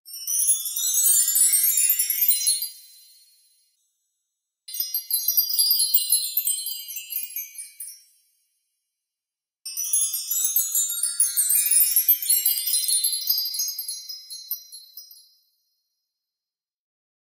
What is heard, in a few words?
Fairy Dust Magic Sound Effect